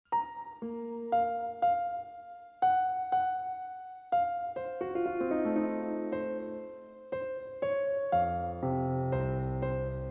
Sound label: Piano